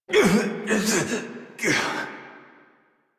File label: Death Adult; New War; Adult Operator